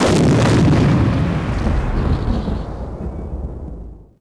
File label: explo med; bwing; impacts explos